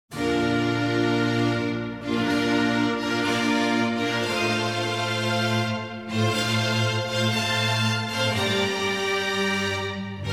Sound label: Organ Concerto in B flat major - A tempo ordinario e staccato; Op.4 No.2; Handel Masterworks; Klassika